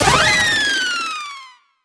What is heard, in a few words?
gachapon start